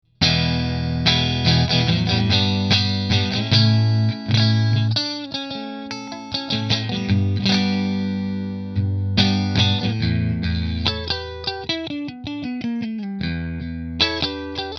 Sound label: Fender Position 3 Fender